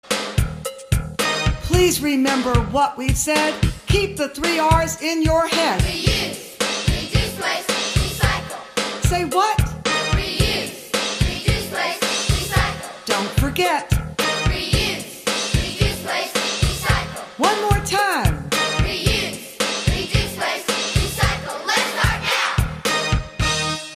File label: Rap